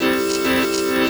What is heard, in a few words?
Chords Tones; Equipped Music; Loungin House